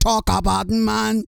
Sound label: REGGAE, Vocal Planet World Voices